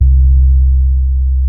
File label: Sub Sonic Bass C2